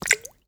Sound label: water drip single; soundscrate